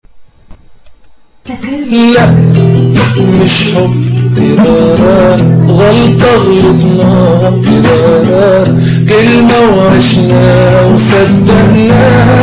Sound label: Guitar; egypt